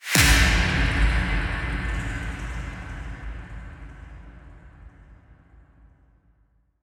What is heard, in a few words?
Impact